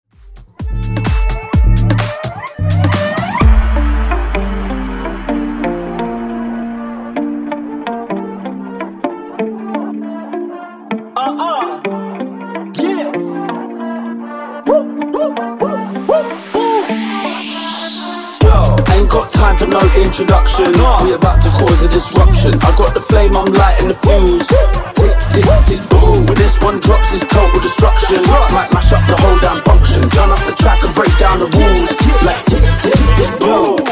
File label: Funk Bootleg Dirty 128 Bpm